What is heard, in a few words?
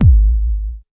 round 808 kick; Kicks; single hits